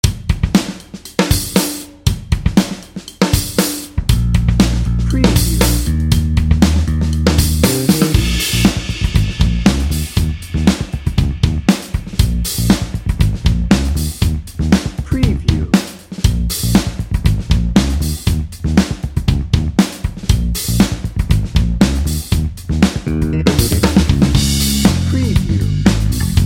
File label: funky and cheerful